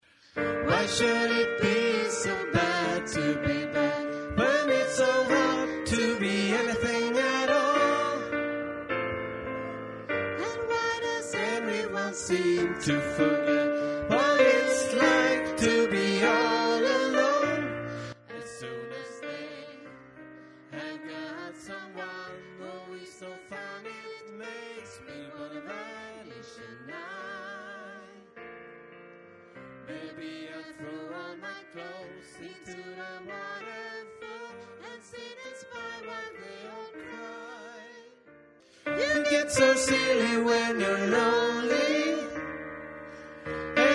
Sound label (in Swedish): bas